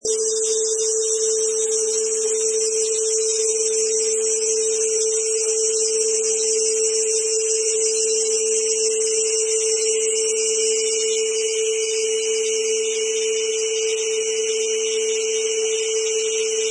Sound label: Cicadas